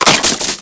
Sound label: frost diver